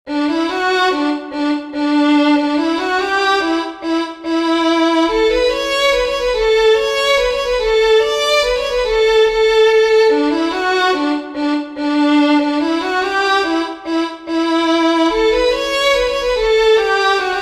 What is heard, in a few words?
JESSICA WALTZ, fiddlers; southern kings fiddlers